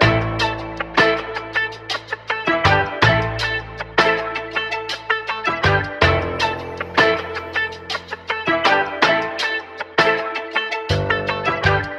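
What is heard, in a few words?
guitar wocka chicka, phonky loops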